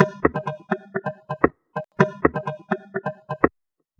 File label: Beat; Electronica; Solo Beats